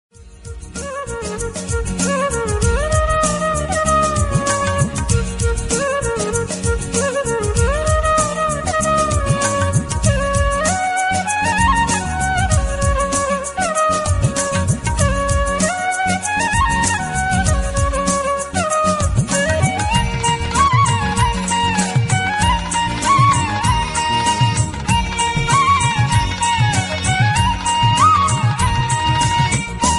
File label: Flute Music Ringtones